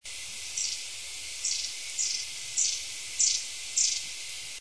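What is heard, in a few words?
long-tailed tit, birds; sinica caudatus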